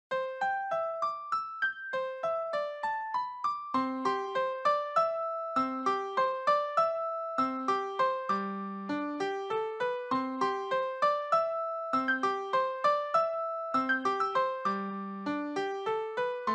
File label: Piano